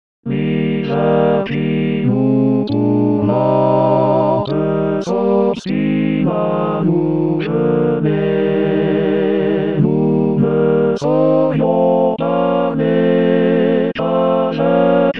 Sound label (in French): Choeur; Lully - le choeur des trembleurs